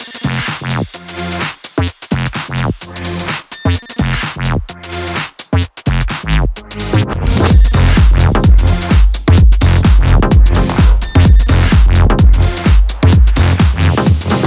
128BPM Mix; Vengeance Samples; VFH1 128BPM Pills Kit

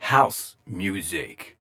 Vocal Phrases; Vengeance Samples; Vocals

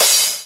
Crash, Cymbals; Vengeance Samples

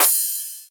Ride, Cymbals; Vengeance Samples

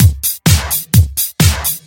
Housebeats, 128BPM; Vengeance Samples; VEH2 Loops

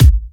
Kicks; Vengeance Samples

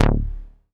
Bass Multi TR D; Vengeance Samples

